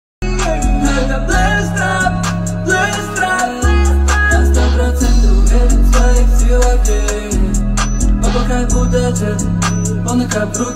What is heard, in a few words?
trap